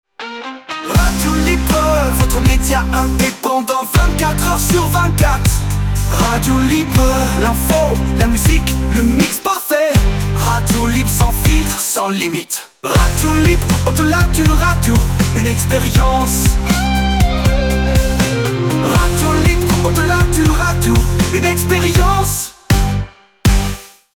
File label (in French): Jingles chante Pop Rock; Radio Libre